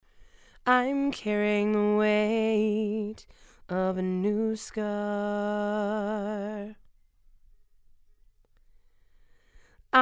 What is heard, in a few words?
Female Vocals